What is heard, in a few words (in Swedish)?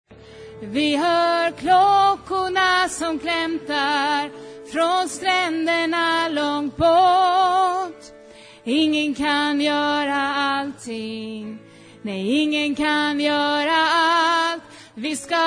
bas